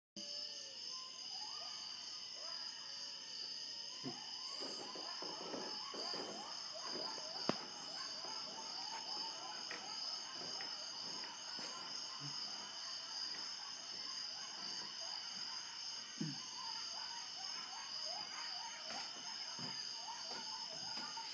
Real Howls

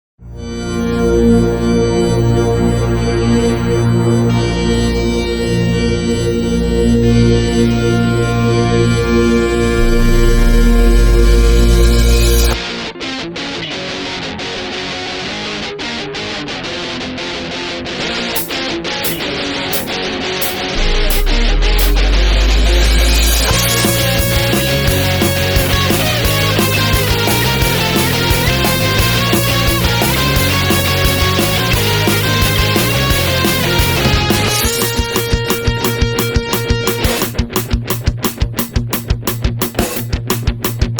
Instrumental; Pony Music Archive 19.03

